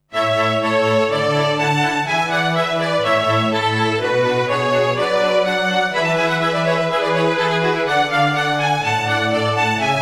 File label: Synthesizer Version; Super Famicom Magazine Volume 12